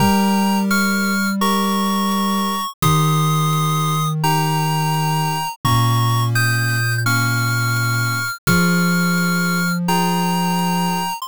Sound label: bgm mellow